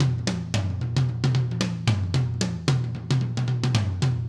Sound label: Acoustic Live Drum; Platinum Audiolab